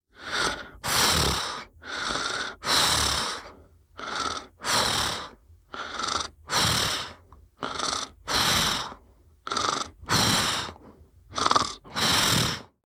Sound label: Sleep loop; Hollow Knight; Cornifer